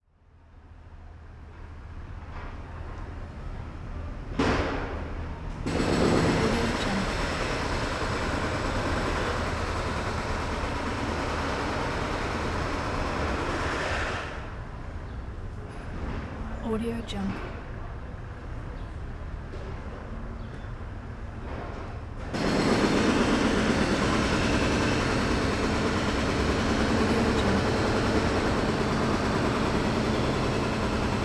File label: distant street construction ambience